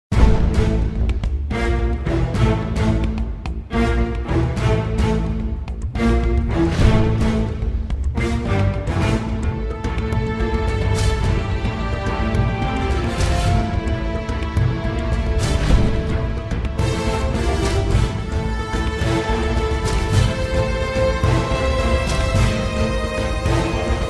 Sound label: battle music; lineage